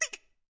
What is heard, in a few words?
quack